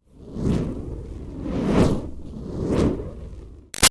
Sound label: Fire Torch Whoosh Med Moves; Flame Sound Effects; Objects; Big Room Sound